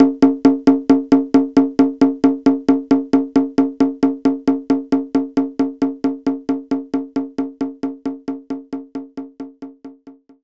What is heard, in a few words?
Conga High Open; knights try